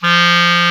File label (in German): Klarinett; Alpine Volksmusik